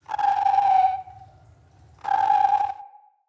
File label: Creature; Ambience; Corrupted Ode Two